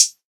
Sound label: Hihat Closed